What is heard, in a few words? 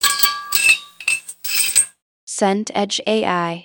cutlery clink